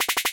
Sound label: standard shot short x4 loop